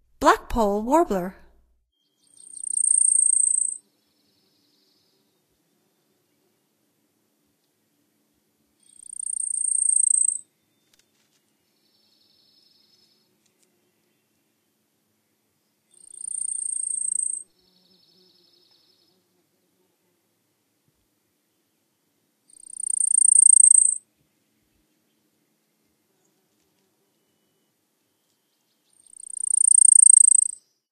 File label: Blackpoll Warbler, Songs of Birds of North America; Stokes